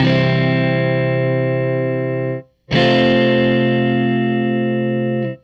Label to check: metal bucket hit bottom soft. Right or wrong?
Wrong